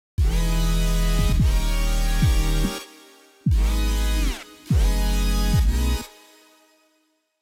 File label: super saw